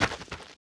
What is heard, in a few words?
run; Monster; 독안표